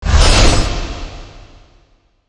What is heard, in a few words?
pull pin, weapons; divine titan